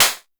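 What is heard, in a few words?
vermona drm1 mk3 snare drum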